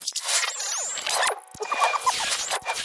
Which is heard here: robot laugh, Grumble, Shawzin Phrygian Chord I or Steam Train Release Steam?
robot laugh